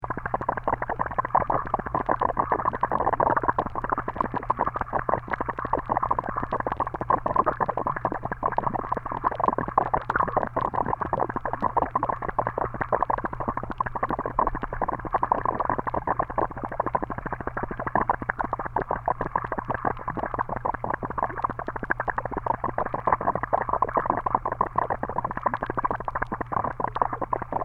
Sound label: Harbin Hotsprings Pool; Quiet American; Aquarian H2